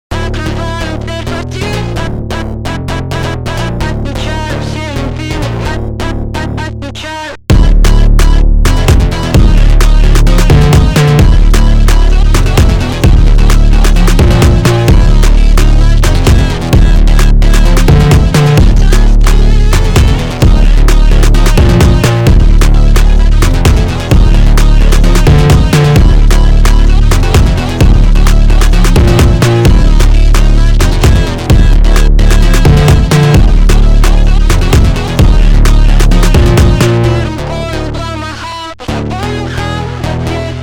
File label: brazilian phonk remix